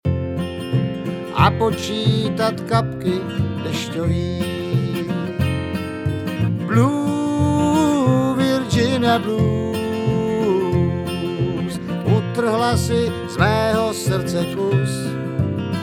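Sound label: vocal Lead, bluegrass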